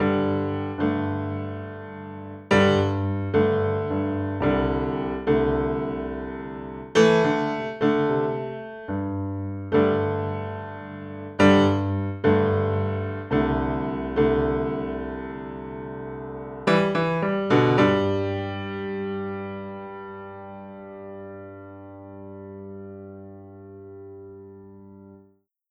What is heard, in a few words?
Country Piano Out End; Digidesign; Pro Tools; Pro Tools Essential Demos; Essential Loop Demos; Demo Session - Country